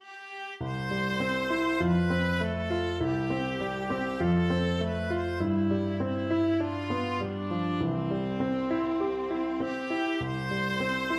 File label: viola